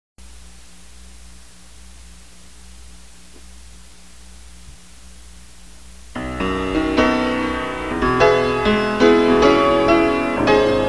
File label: PIANO